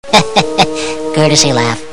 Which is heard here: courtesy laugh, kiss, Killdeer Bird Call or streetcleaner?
courtesy laugh